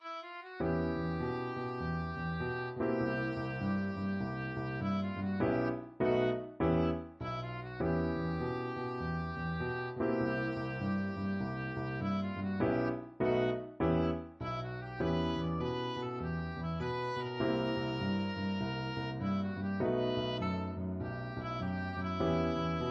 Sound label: minor mooch, oboe